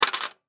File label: Coin Nickel Single Drop Drawer; Money; Foley; Blastwave